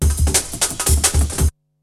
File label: BREAKBEATS, TECHNO